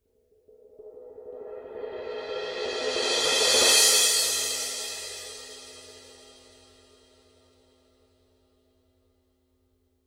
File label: thin crash roll; paiste 2002